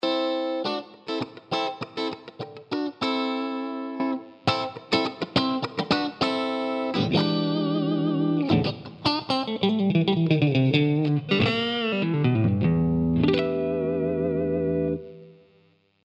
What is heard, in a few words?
Constrictor squeeze strat; line6; tonecore; simulanalog